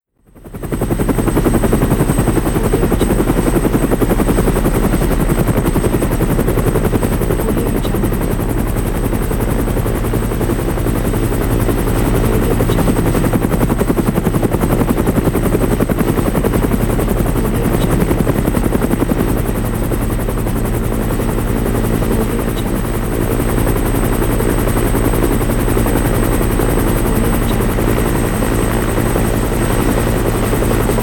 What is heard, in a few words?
helicopter